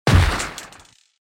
desert eagle

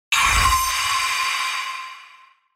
UI comform sound

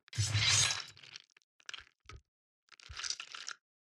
pulling out KATANA

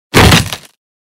crate stash; ROOTBOX

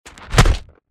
SMACK

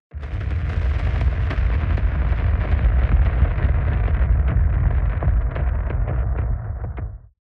ROCKET DOWN CAMERA

